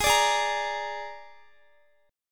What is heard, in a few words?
G sharp Suspended 2nd Flat 5th, Guitar; strummed